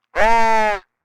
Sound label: Walkie talkie conversation vocalization small shout